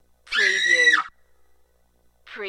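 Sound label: Female Scream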